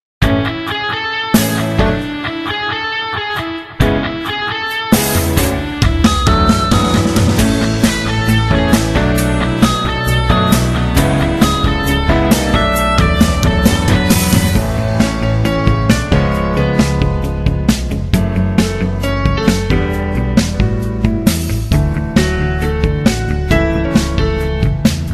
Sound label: kirakira short bgm